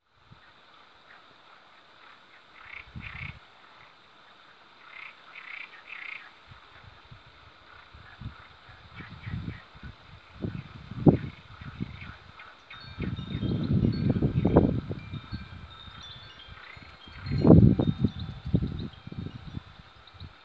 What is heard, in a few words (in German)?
froesche